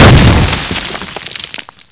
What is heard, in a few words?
explode, weapons